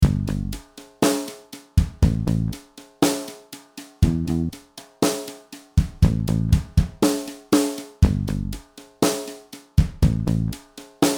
Brushes, snare drum

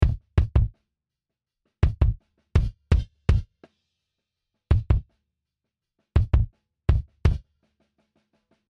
Compressor & Saturator, acoustic; enhance acoustic

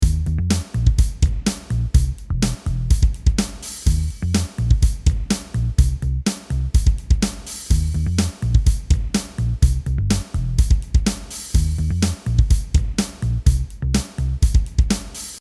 Open without choke; DrBeat

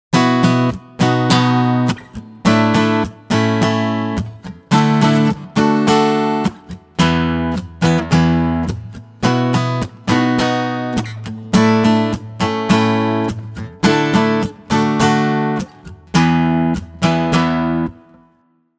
Sus chords example, acoustic chords; 30 acoustic chords

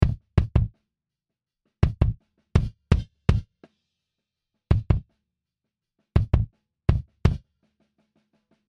Compressor, acoustic; enhance acoustic

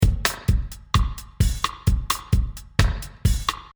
Beat 130, dub

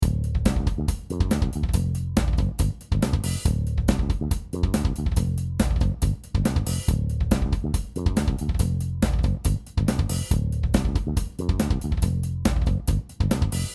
Too fast for one foot, kick drums

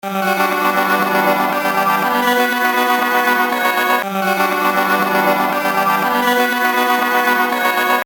Untreated Loop

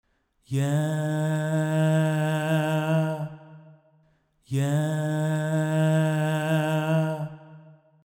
Unpitched vocal

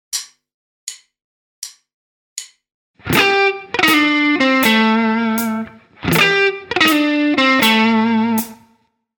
blues tricks, guitar; eight essential lead tricks

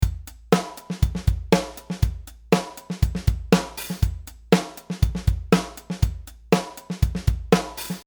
More ghosts

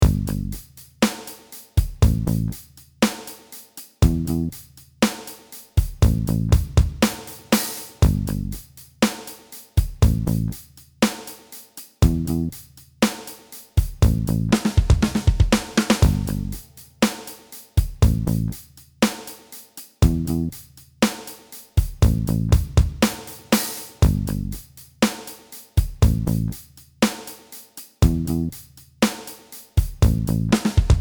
Rimshot, snare drum

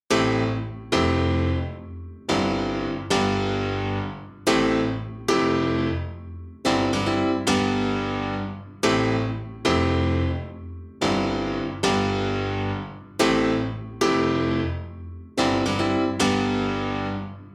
on piano; headphone mixdown

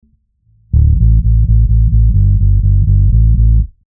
Bass 126 D, dub

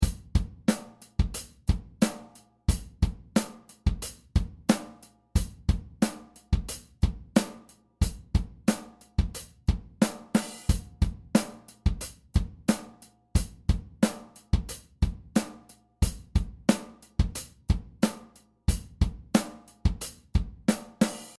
Articulated hi-hats

